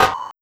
Subtle Snare; Sonic Foundry (Sony Creative Software); Esoterik Beatz; Subtle Kit

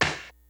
GREASY KIT, DRUMS; Kid Nepro; Elite

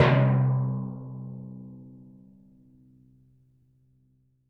Roland TD-20 Percussion

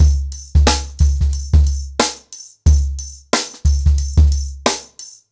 Nuclear 90BPM, ACOUSTIC DRUMS

